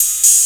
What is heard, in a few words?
Cymbal; Sonic Foundry (Sony Creative Software); Trance NRG VOL-2; Drums; Cymbals